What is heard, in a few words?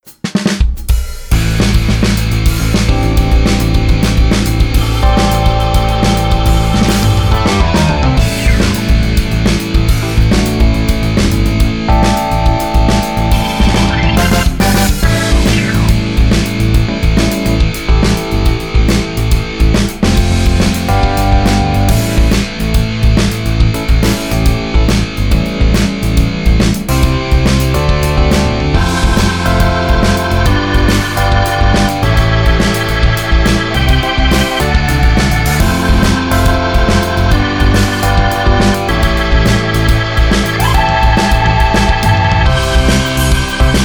Rock; ABILITY